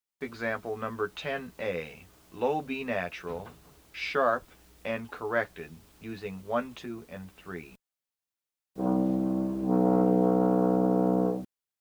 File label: Emb TUBA